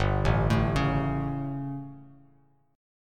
G Suspended 2nd Flat 5th G down Bass Standard, chord; Gsus2b5 arpeggiated; JGuitar